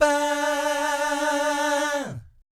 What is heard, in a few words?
VIB FX, Vocal Planet Jazz & FX; ILIO; JAZZCOMBO